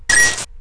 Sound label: item rupee; zelda